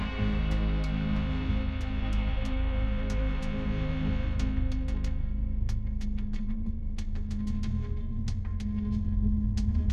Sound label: tension music; sound effects